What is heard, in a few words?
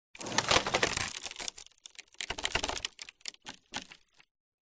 crashes wood; Audio Mangler